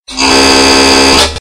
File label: En57 Door Buzzer